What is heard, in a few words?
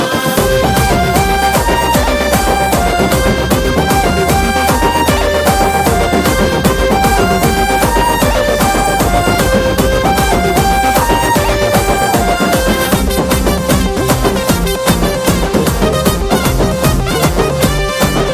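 background music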